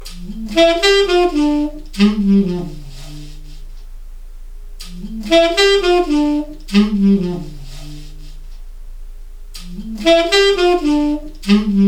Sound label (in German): sax mit ams